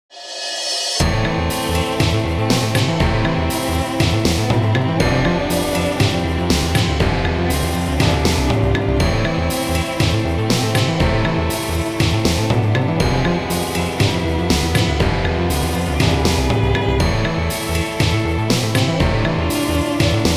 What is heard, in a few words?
world off vocal; inst